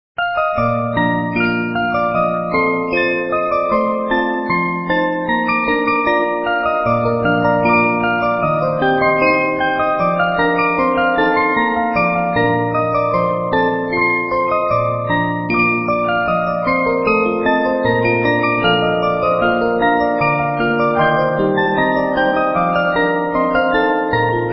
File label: THE MAGIC FLUTE (GLOCKENSPIEL); 72 Note